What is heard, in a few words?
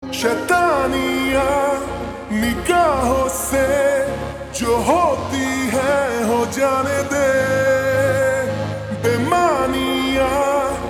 Ringtone Slow Reverb